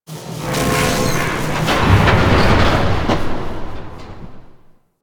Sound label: Exterior Floating Metal Scraps Start; Ambience; Props; Warframe; Railjack